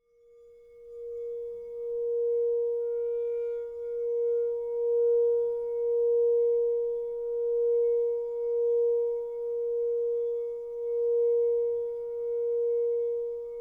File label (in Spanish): BOWL LA; sonidos de cuarzo; escucha y armoniza los chakras